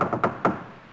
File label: Boat Creak; Civ4 beyond sword